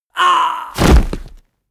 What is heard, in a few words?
infantry die